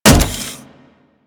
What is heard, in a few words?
Riot Moa Step Default, Footsteps; Warframe